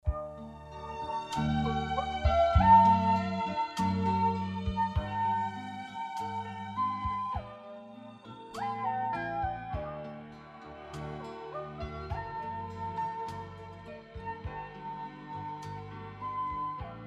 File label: Strings Intro; AGrace